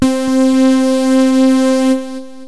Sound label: syn, loops synth